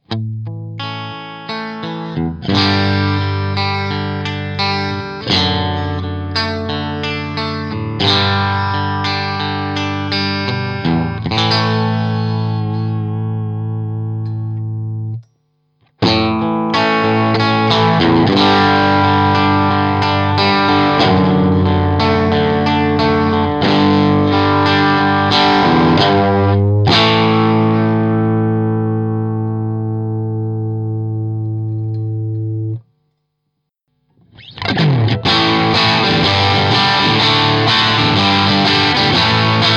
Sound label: strat bridge; 65 amps soho